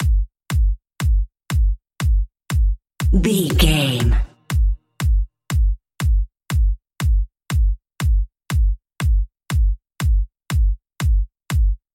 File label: drums only